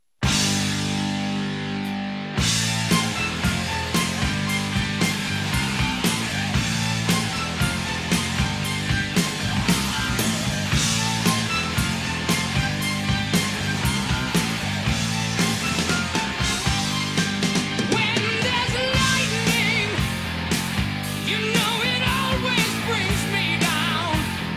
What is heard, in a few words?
bgmusic